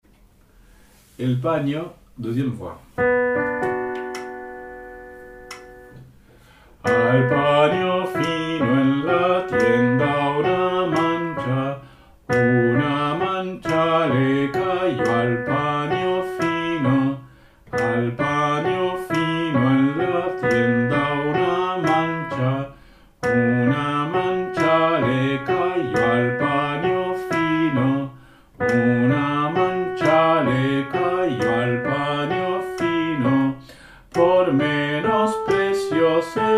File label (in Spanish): El panio Alto